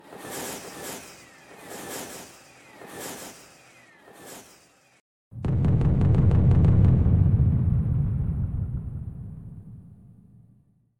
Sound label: woooow Bombsss